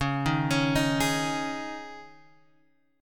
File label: C sharp Suspended 2nd, C sharp, Guitar, Open G